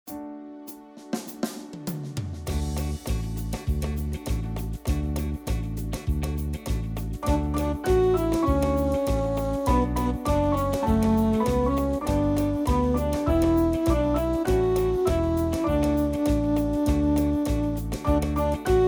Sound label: Samba